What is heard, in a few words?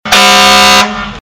firetruck horn